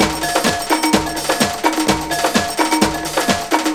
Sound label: Latin House Loop 128 BPM; Vengeance Samples